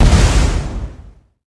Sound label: Fire Damage; bullet